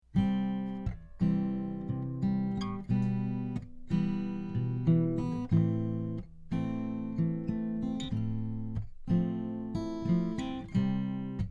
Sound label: Neat Bumblebee II acoustic guitar microphone sample